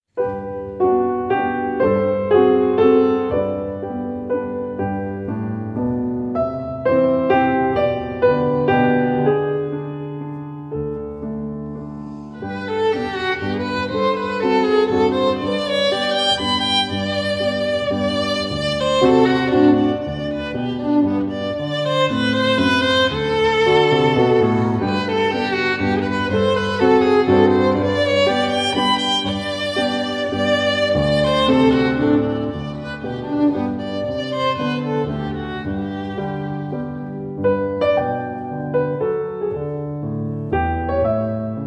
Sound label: Andante for Violin and Piano in G Major